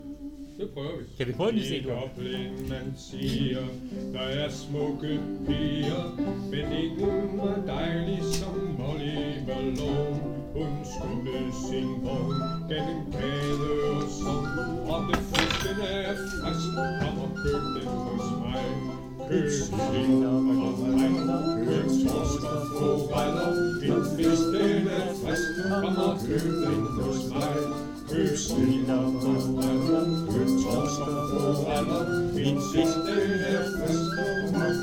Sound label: Molly Malone i C